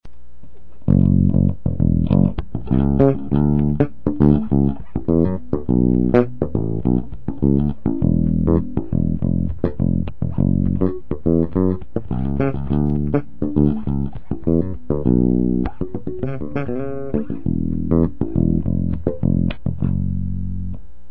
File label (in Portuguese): groove, baixo